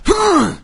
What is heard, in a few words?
zombie pain; doom3; fatzombie